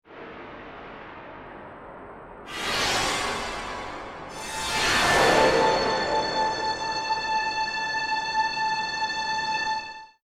Stinger Horror Musical Scary Piercing, Stinger Musical Scary Piercing Metallic Trailer Transition; Stingers; Sound Design